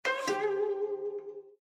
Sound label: Windows Error